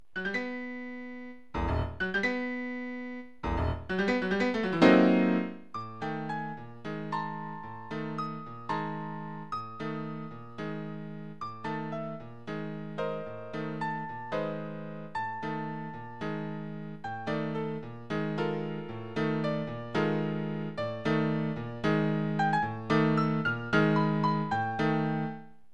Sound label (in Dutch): stukje voor piano